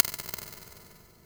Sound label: echo multitap